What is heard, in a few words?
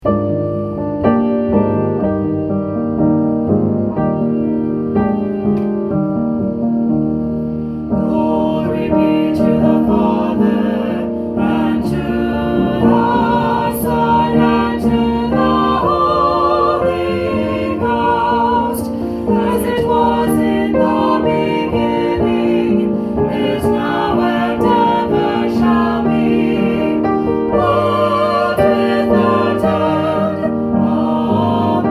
Gloria Patri with vocals